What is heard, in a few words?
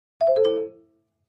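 Clavichord Short Descending; keyboards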